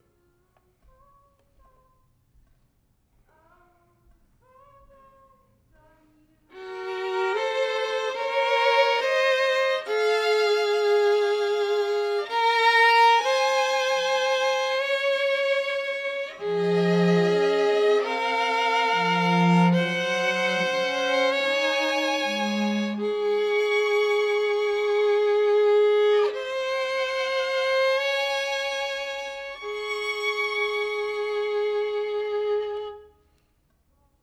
violin

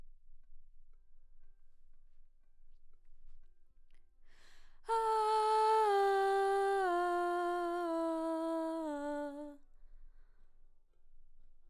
BG Vox

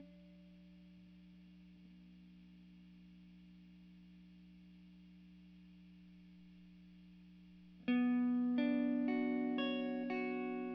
GTR; RE20

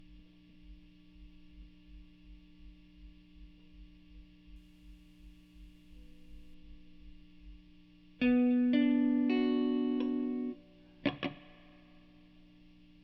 GTR

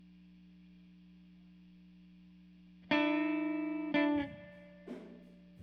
GTR RE20

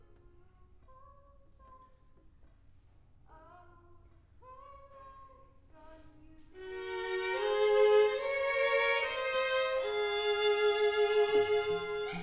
Cello

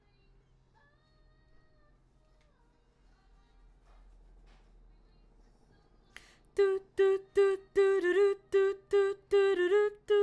BG Vox

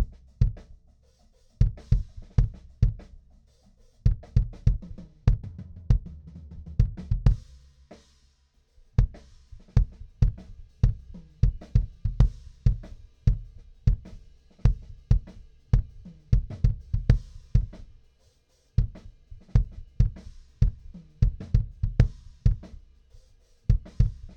Kick

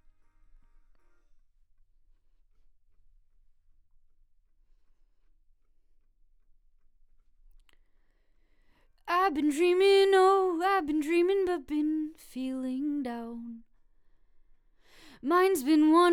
Vox start new

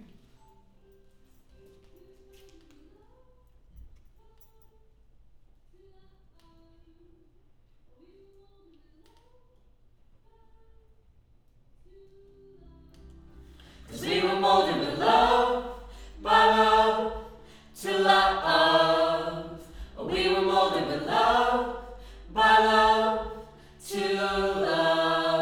Choir Mid

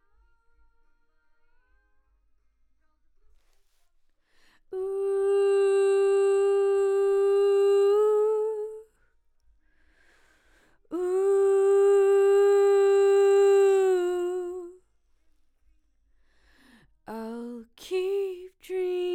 Vox start new

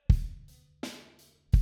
Kick

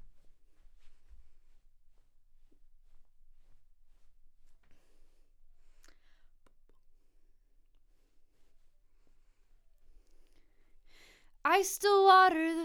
Vox

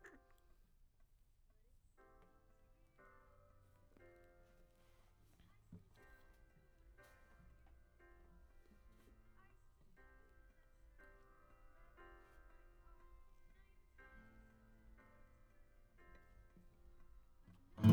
AC GTR

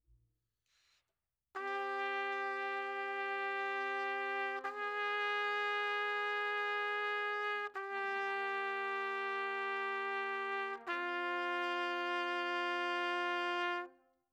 Trumpet

